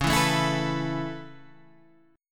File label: A Major Add 9th C sharp; Aadd9/C# strummed